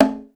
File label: Congo, Alesis SR-16, Percussion; Drum Samples